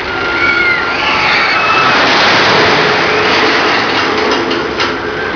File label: coaster